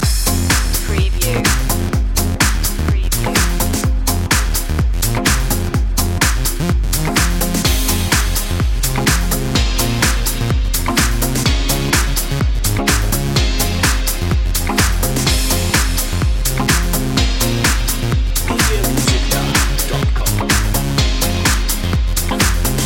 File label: drop drop drop loop